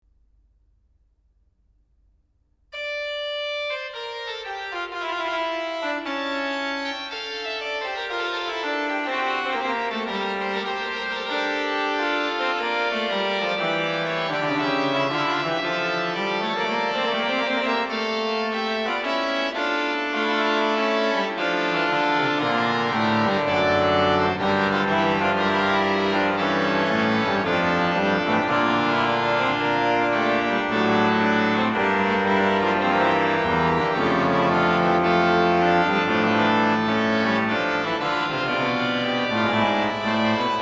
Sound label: fugue; Louis Marchand; Premier Livre d'Orgue